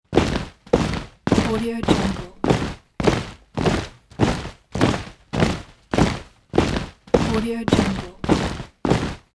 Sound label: soldiers marching